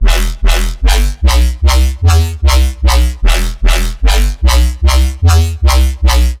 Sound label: melodic second wave; bossfight